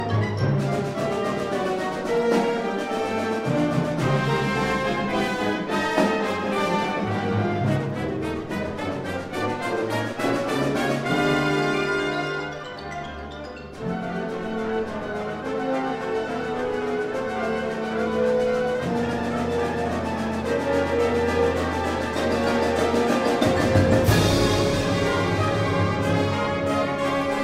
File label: Variations and Fugue; TMEA 1988